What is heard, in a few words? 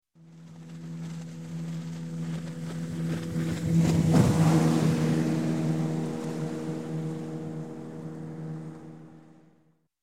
Boat Outboard, 50 Hp - Pass By, Fast, Marine; Sound Ideas; Series 2000